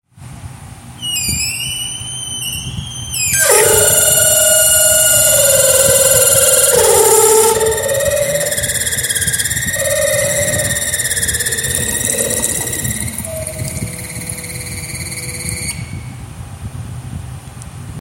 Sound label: tap sound